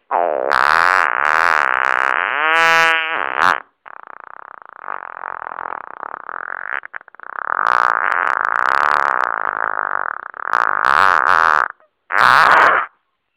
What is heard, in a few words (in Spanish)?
Pedo de sobremesa